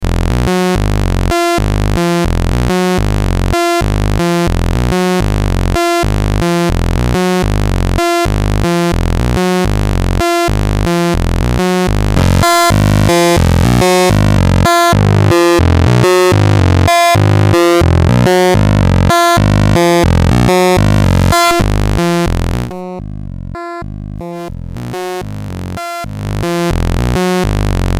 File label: voice saw shaper square volume change